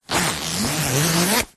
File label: clothing high vis jacket thick zipper; zapsplat